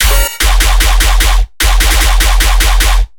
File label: bass loop Industrial Process; Virtual Riot